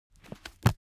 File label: object book heavy book shut; smartsoundfx